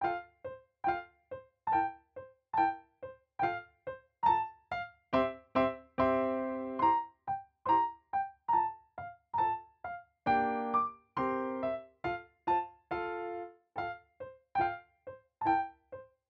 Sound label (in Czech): Etuda